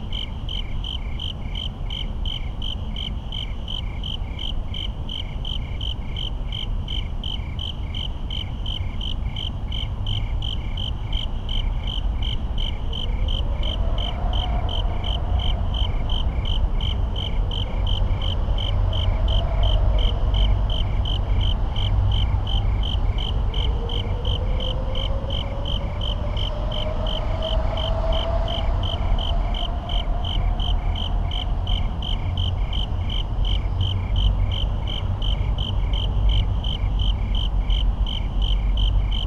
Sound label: Suburban night crickets rapid traffic echoes; urban and suburban; boom library